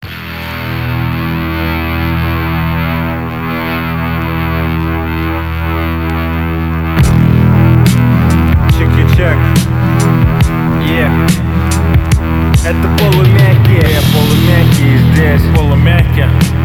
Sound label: Hardkick Remix